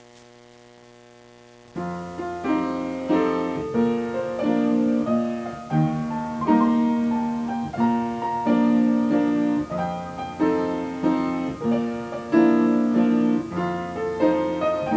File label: mazurka, piano